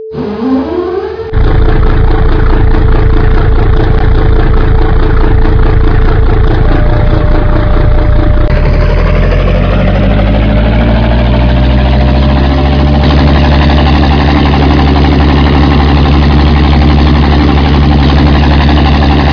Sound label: Scania 12cyl